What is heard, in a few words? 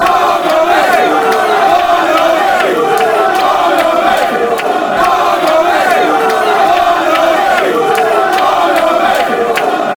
barmy army chant